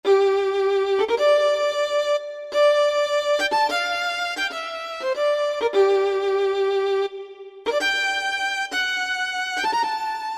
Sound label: Violin, Yamaha PSR-3000; Factory Voices